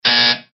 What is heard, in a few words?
deny